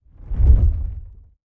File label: buy close; silverback